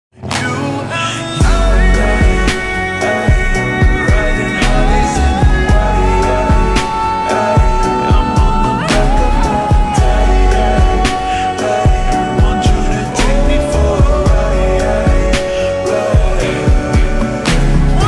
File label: Slow Reverb Version Ringtone